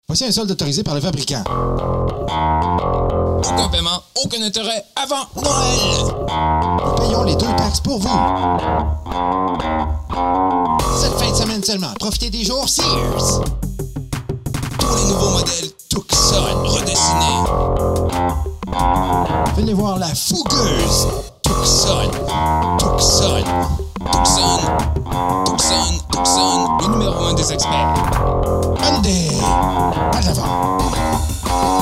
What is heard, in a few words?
pub